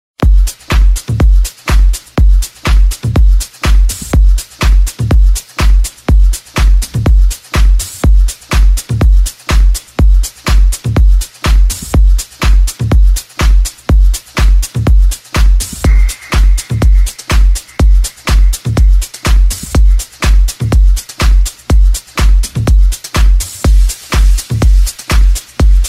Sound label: samsung s8 progressive ringtone